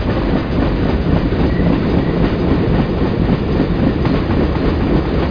train Tunnel

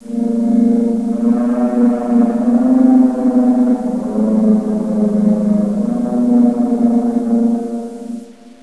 TONE; thief

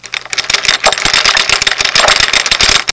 winch; Sound Effects for Multimedia - Volume 1

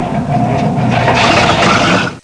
machine

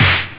house, drums snare; OctaMED SoundStudio V1

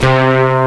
distort; Descent

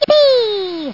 yippee; santa&rudolf

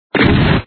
lib shoot; sheep are save

